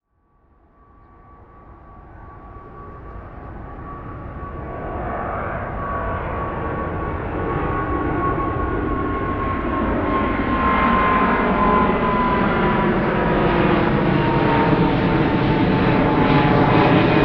passenger plane passing by

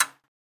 turning on tv

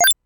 cancel

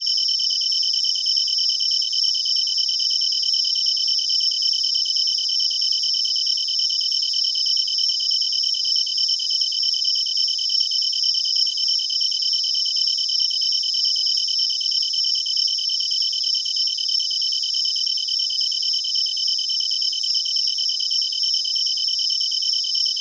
tree cricket bush

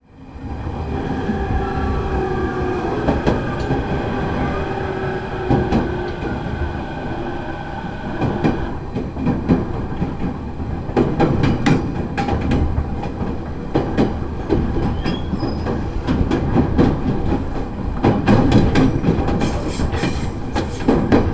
train arriving at station inside train

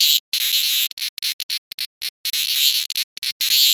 hyalessa maculaticollis cry

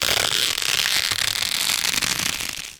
squeezing fists

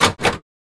raising and lowering a pinball flipper

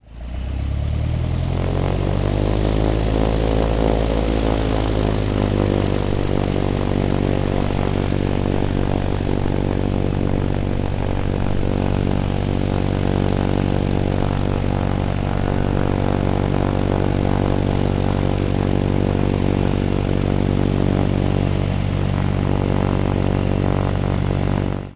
starting a cessna engine

